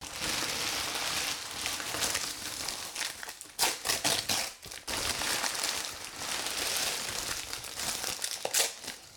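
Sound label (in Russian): действия со стопкой бумаги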